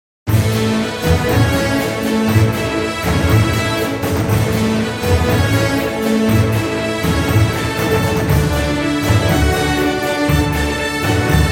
March

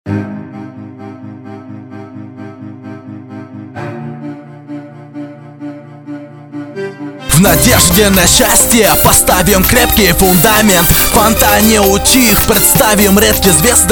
rap hiphop

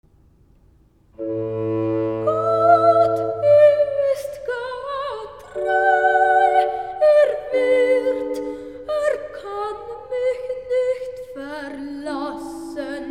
Recitativo; Gott ist getreu

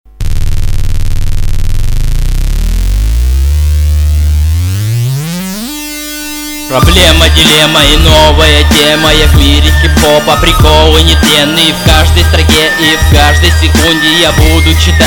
battle rap

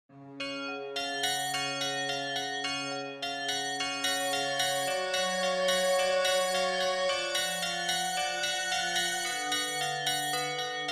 hare rama instrumental

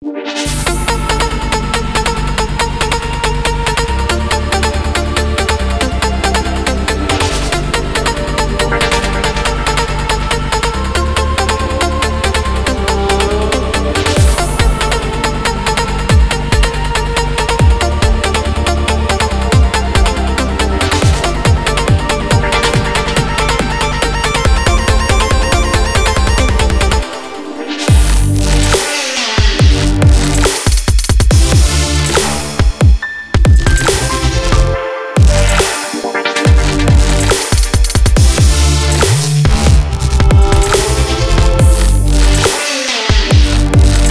Future Bass

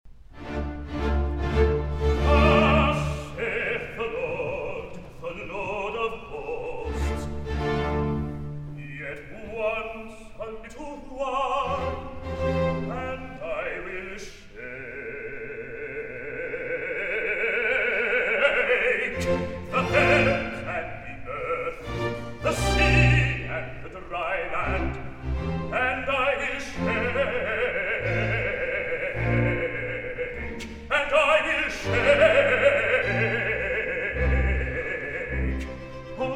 Recitative; Thus Saith The Lord Of Hosts

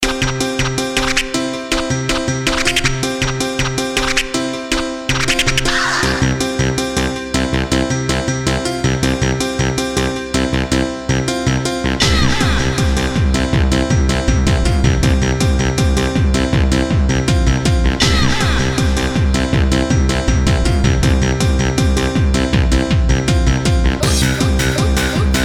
Happy Happy Hardcore

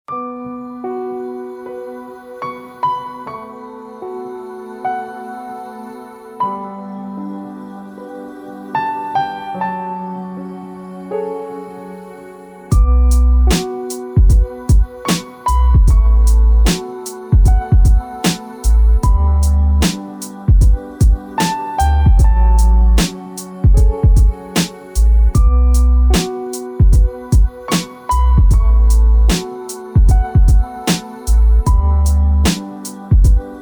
Beat Tape

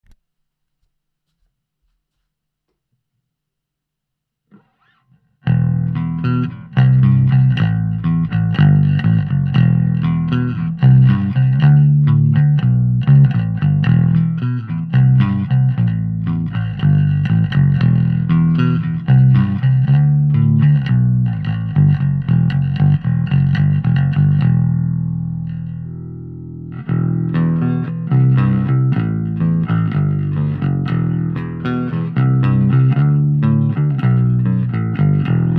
FENDER JB-STD

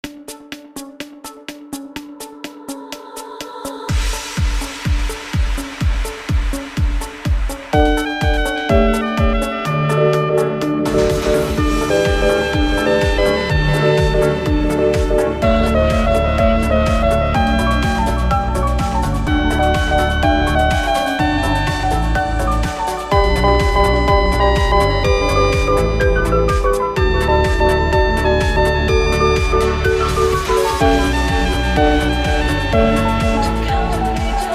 Mozart Lullaby

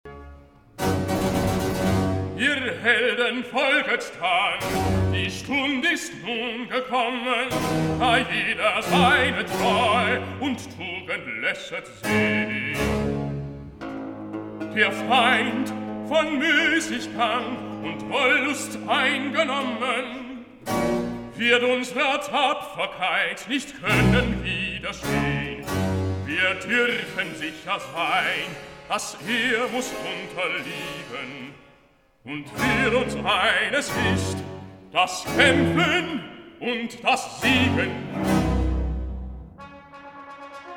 Recitativo; Ihr Helden, Folget Dann

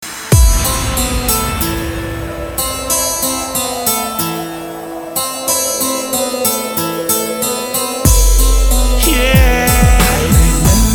Electro Stress

